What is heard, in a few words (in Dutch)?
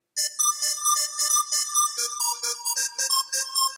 Muziekfragment 90S